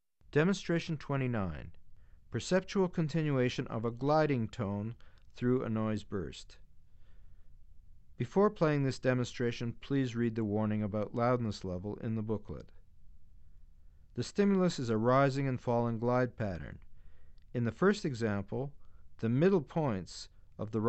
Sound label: Perceptual Continuation Of A Gliding Tone Through A Noise Burst; Bregman ASA; auditory demonstrations